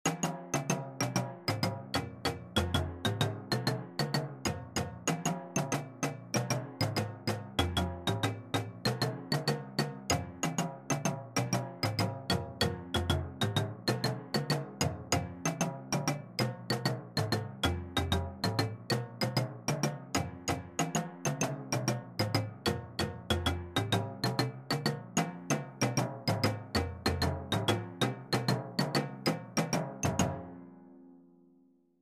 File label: double beat, percussion; marching